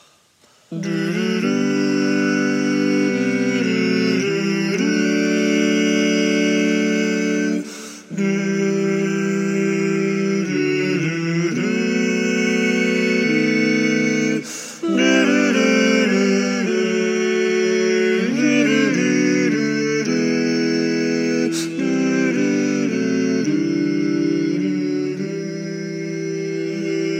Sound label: no bass; Bass Part